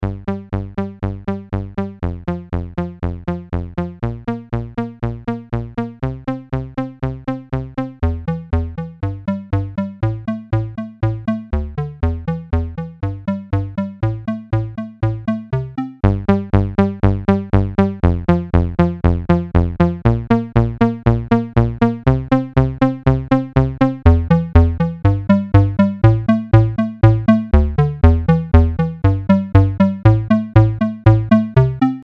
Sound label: bass wet chorus